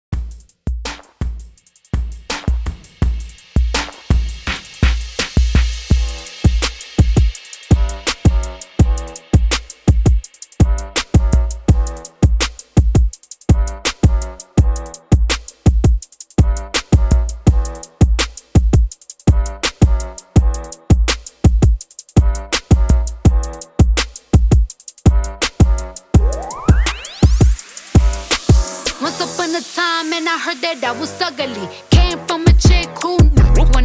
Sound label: 83 BPM; CLEAN DIRTY 2 EDITS